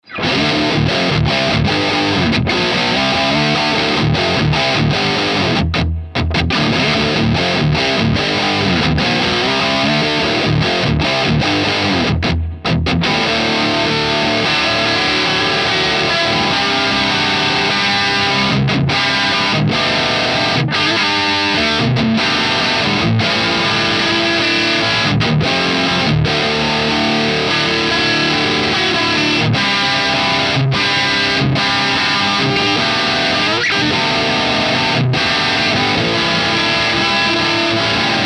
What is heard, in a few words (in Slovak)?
Roadster Recto Vintage 3 kanal